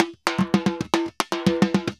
TIMBA, SALSA; World Grooves; Best Service ProSamples vol.14; AKAI

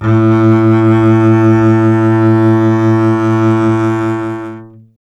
STR CBS, Solo Instruments

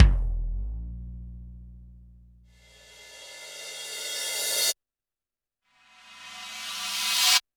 percussion, Roland TD-20

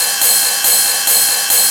Ride 140-BPM, Ride Loops; Zero-G Club Techno; Drum Loops

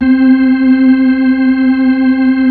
B-3

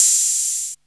AMB HHO

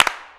CLAPS, SINGLE CLAPS; Best Service; World Colours

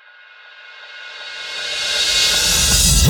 Reverse

